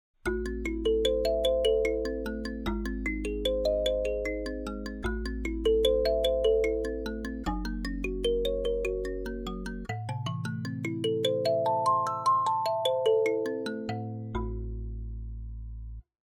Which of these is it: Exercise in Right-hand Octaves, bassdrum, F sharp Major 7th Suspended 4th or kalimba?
kalimba